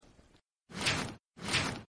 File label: sand Slow; Legends of the Hidden Temple - Unlock the Past